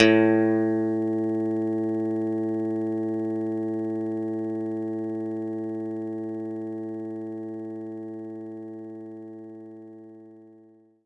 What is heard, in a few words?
Clean Guitar A2; knights try